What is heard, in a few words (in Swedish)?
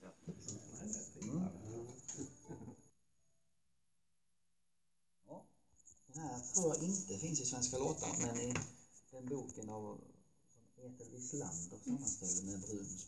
engelska